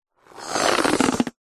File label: foley balloon inflate short single breath expand creak; zapsplat